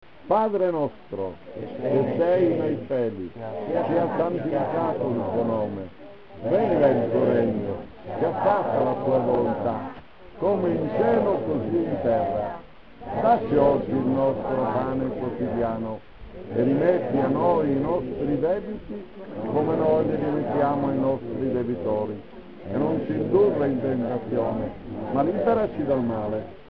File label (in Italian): padre nostro; gruppo s giorgio